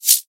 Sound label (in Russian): neobichnij shelkayushij zvuk